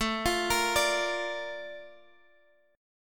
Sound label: A sharp Major 7th